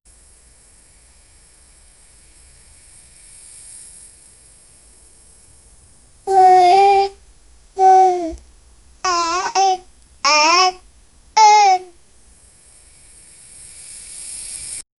tense repetitive baby sou

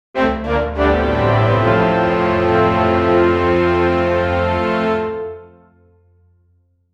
military naval promotion; authoritative

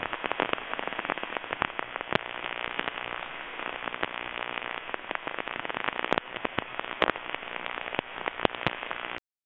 digital crackle with heav, shortwave

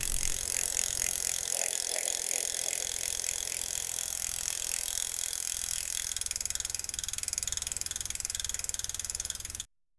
bicycle subtle click

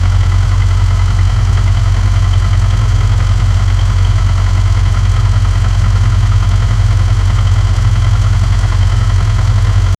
close up recording of a v, locomotive

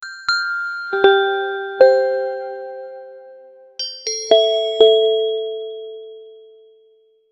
an amateurishly composed, call

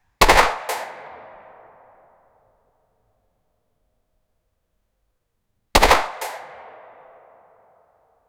shot gun small room; close range

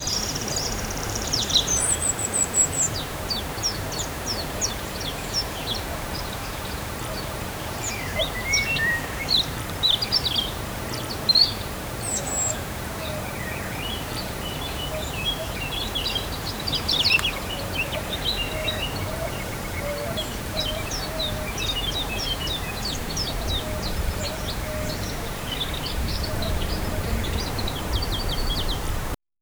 sounds of nature a sunny, field

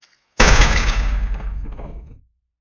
shelter fail collapse sound; structure

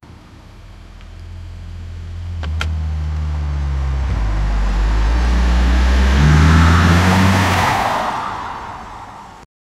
the 5 sounds of accident, vehicle

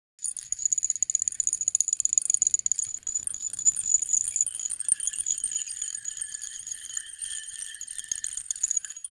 a kiwi made entirely of, fruit

